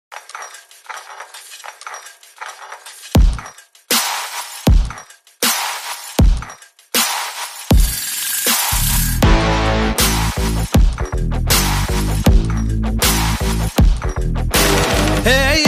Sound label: vintage love bgm ringtone; kavin